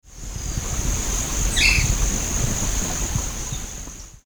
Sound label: Myiarchus ferox Maria cavaleira